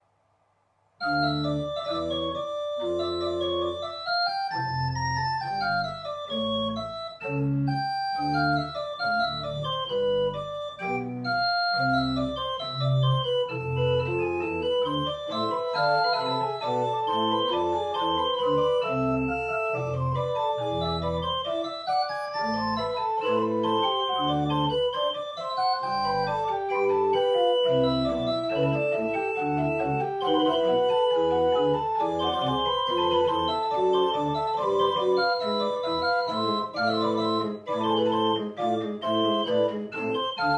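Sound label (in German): Schulte, pfeifenorgeln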